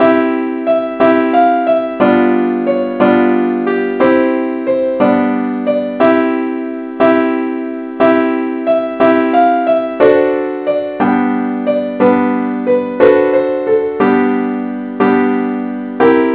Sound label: simple acc key c; angelvoices